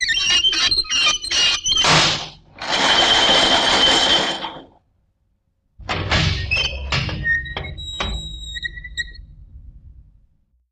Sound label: Trundle Squeak x2